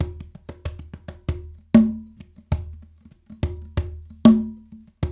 World Dance; Spectrasonics - Supreme Beats

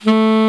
Alto Sax A2; Proteus 2000; Digital Sound Factory Proteus 2000

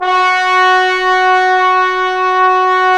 BRS TBNS, LOW BRASS; Roland - LA Composer vol. 1

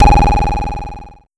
Pollard Syndrum

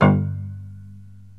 PITZ PIANO

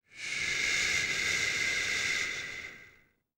BRTH, BREATH FX, Jazz FX; Spectrasonics; Vocal Planet

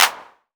CLAP, HAND CLAP

